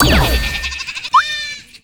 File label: ui win